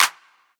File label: verby clap single shot D key; single hits one shots